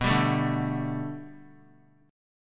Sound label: B Minor 7th Sharp 5th B, chord; Bm7#5; strummed; 23,26,24,24; JGuitar